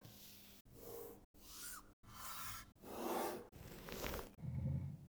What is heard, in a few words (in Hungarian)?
skala; mate skala